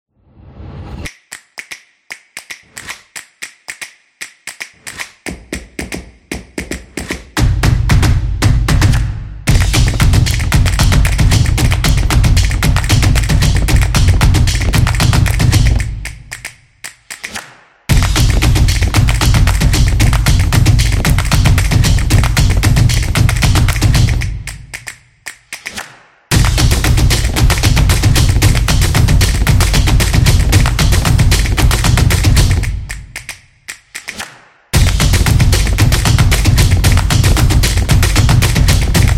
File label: This Is Drum Upbeat; event promo